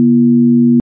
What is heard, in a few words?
large volume sine f100hz